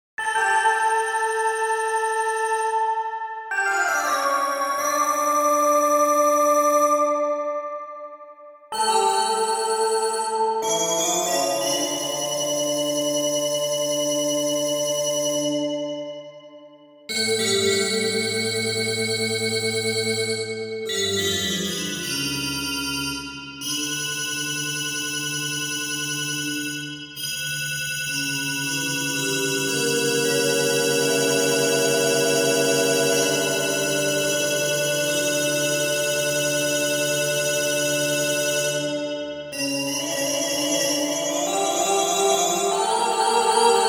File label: Bach Toccata intro